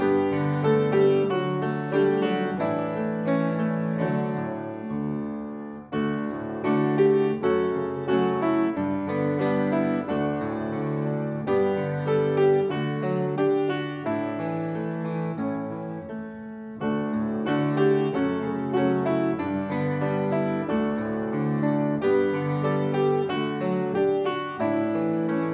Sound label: Hymn; Hymnal 1982